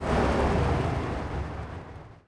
engines; World of Tanks

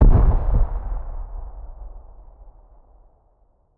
c4 explosion distant; Rust

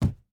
footstep burlap wood jump land; Rust

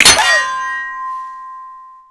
impact metal; Modern Warfare

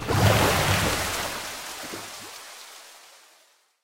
water collision

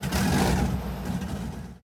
start engine, engines; World of Tanks

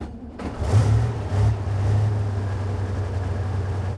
start engine, engines; World of Tanks

